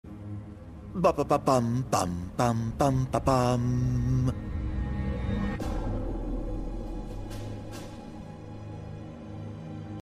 victory fanfare; final fantasy xv; ardyn izunia

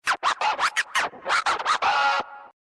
scratch

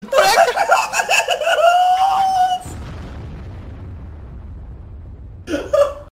laugh music

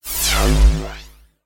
blast

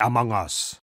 suspicious spy

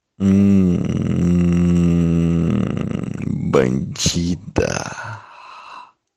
hmmmm

